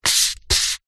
food drink coffee cup disposable plastic lid turn slide on cup